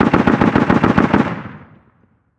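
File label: gun 50cal distant; ModernWarfare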